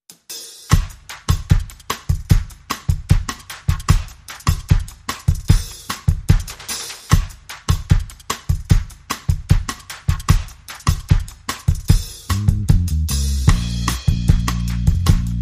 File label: Bossa, Drums and Bass Only